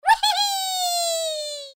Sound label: voice laugh; Fruity Burst 2